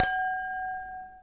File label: glass camerton ish, crystal glass strikes; UNUSUAL KITS and FX